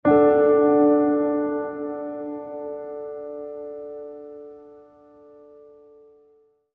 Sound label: Happy Chord